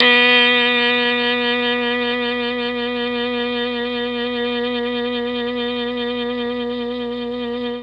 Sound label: B2 MKII sax, mellotron